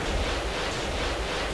fly; cstrike; codmw2

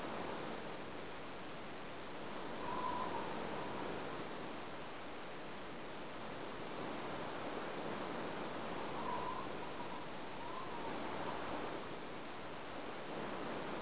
wind; cstrike; codmw2

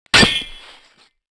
katana knife hit wall; cstrike; weapons; knifefun

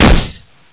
snowball hit; cstrike; next21 kart

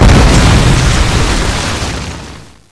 explo; cstrike; codmw2

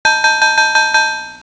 win; cstrike; bomberman mod

